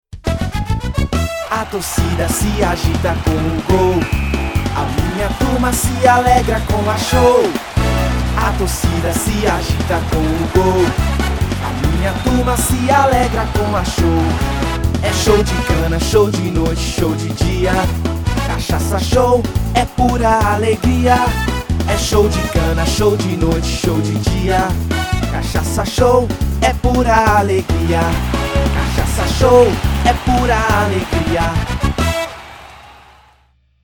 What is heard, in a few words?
JingleShow